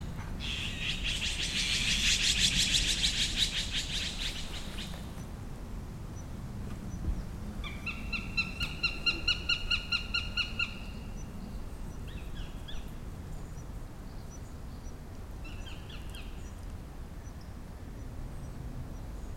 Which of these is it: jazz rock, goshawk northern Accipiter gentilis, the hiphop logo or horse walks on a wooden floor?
goshawk northern Accipiter gentilis